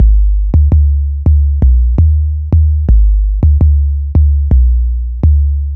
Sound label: 808 Standard, Bass Lines 166.5; Jungle; Zero-G Jungle Warfare 1, 2 & 3; 90 s Sample CDs